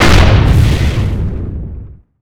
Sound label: lightning; SPELLS